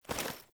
Female Foley Action Default Melee Swipe, Default Clothing; Characters; Player